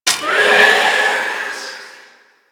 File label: Grimoire Vulnerable Impact; Enemies; Warframe; Entrati Doppelganger